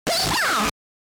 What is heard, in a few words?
Pikachu SFX; RBY